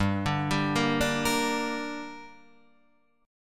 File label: G Minor G down Guitar Half Step Down, chord